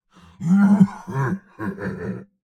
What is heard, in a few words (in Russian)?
Смех; Турбосвин